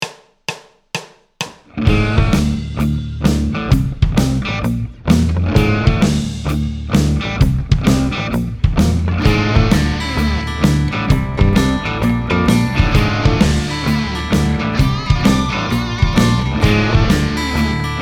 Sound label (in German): Dissonanzen nutzen mit Bass; kursteil 4